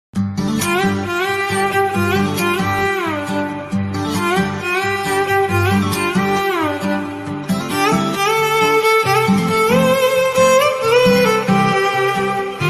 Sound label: love BGM ringtone south Indian BGM ringtone Telugu BGM tone; kumari 21 f; famous telugu bgm